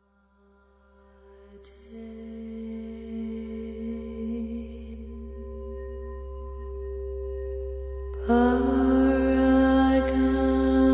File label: Heart Sutra Heart Mantra